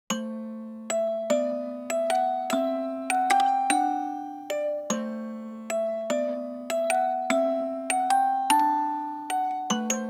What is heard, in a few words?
journey music box; maplestory